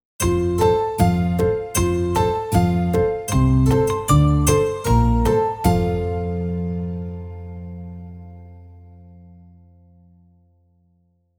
Happy Ident

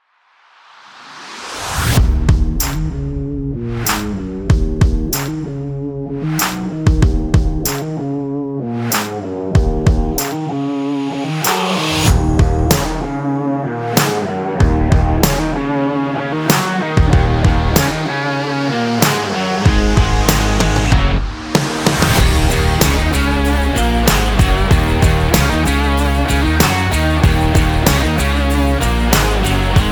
Sport Rock

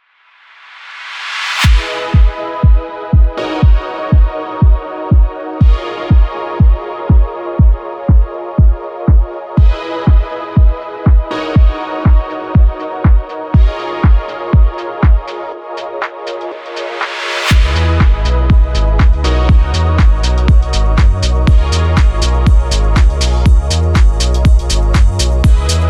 Fashion House

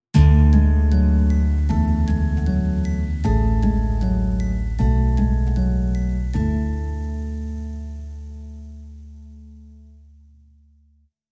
Deep Thinker Ident

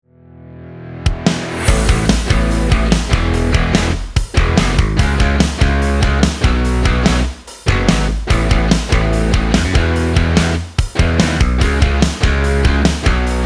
Rock Channel Intro

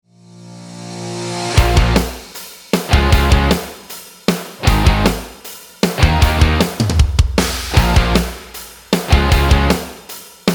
Dirt Track Intro

